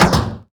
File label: Door Closed; FREE SFX PACK VOL 3